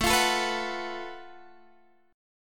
Gb Minor Major 7th Double Flat 5th A

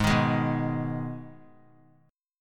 G sharp Minor G sharp down, chord; G#m strummed; JGuitar

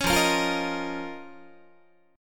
F 7th Suspended 4th Sharp 5th F down Guitar

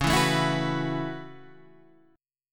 A 6th Add 9th Db, guitar

